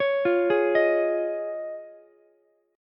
Eb 7th Suspended 2nd Suspended 4th Ukulele, chord; Eb7sus2sus4; arpeggiated; 18,17,16,18; JGuitar

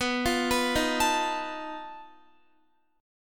B 7th Flat 5th B Guitar; B7b5; arpeggiated

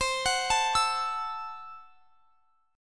C 6th Flat 5th C down; C6b5 arpeggiated

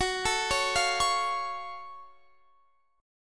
Gb Minor Major 7th Gb

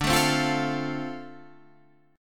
D-Minor 7th D Guitar Half Step Down, chord

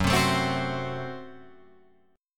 F sharp Diminished, down, Guitar, Open F; strummed

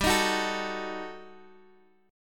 Eb Diminished G; Ebdim/G strummed; JGuitar

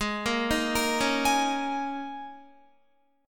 Ab Suspended 2nd Suspended 4th Ab down Guitar; arpeggiated; Absus2sus4

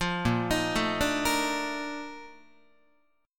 A sharp Major 11th Guitar, chord; arpeggiated

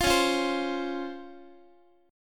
C sharp Major 7th, Guitar; C#M7; strummed

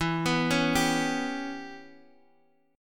G 6th Flat 5th E

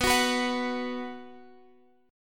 B 5th B, chord; B5 Chord {X 14 16 16 X 14} strummed

